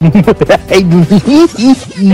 laugh evil; delirious

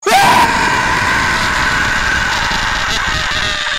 boo scream; when you see a boo

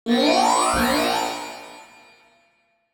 magic surprise

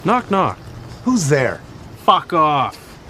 knock knock

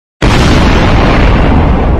big explosion sound

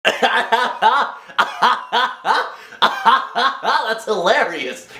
ahahahah thats hilarious; filthy frank

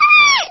cry; pikmin

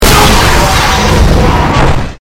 roar; tsm

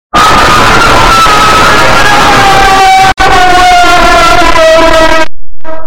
jumpscare sound; piat nochei u iozhika 1

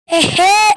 hehehehehehaha

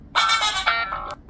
goofy ringtone funky band

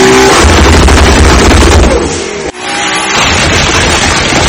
toyota supra mk4

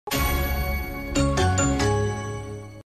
intel pentium 3 m processor logo

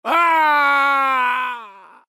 fall scream; mk9 nightwolf

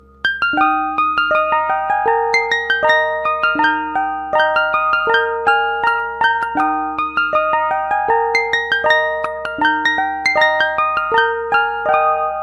ice cream vehicle song

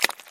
slime splat; slime rancher

